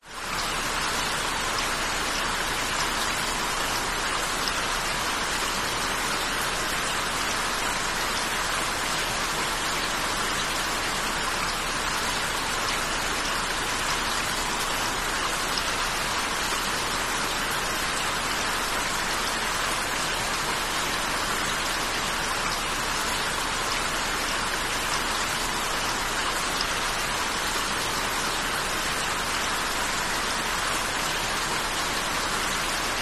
nature rain medium falling roof house street and guttering